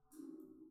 catwalk footsteps

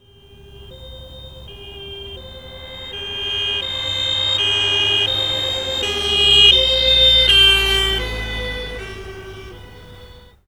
Doppler

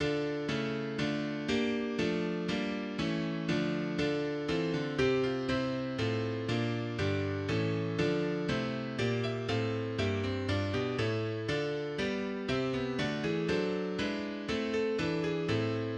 bass sub

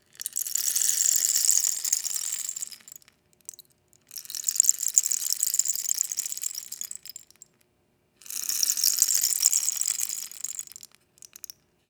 Rainstick

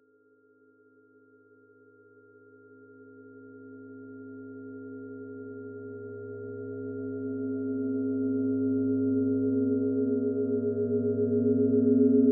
Hit, Earth & Steel

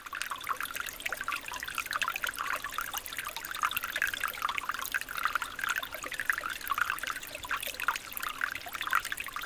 Trickle; Chalice Well

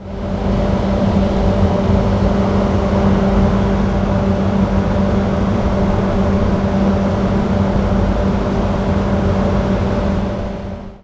Ventilation; UBC

